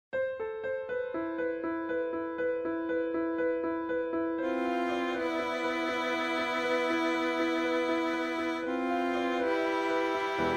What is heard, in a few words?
violin piano